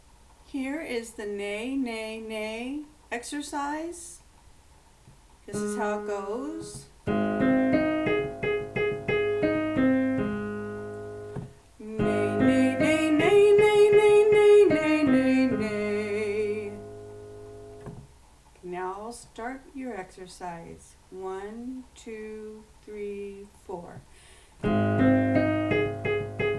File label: Nay Nay Nay, warmups